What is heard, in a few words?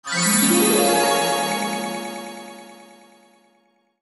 Magic harp glissando